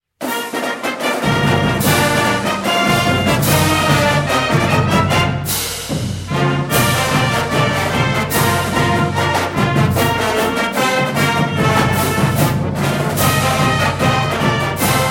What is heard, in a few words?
fight song, marching owls; FAU